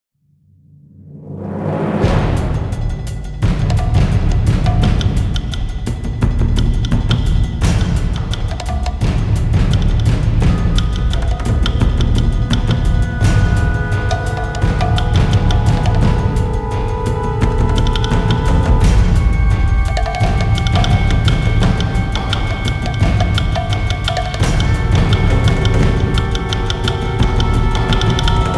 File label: combat